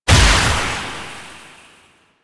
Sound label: Projectile Death Tech Medium; Duviri; Incarnon Adapters